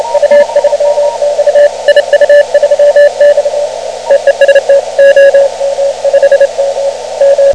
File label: 7MHz